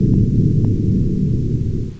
sub Nav click; The Lord of the Rings The Two Towers CD Cardz Rohirrim

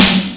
Snare Explo; Utilities Professional

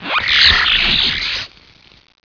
mana burn; mtgdemo

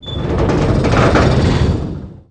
metl door; REDNECK; RAMPAGER

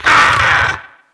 gynosphinx hit; DAoC

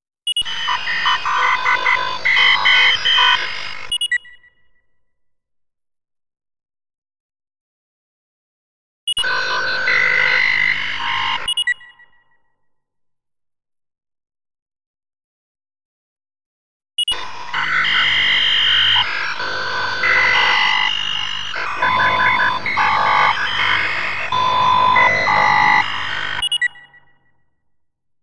satellite ambi

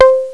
marimba; Echo 1.1

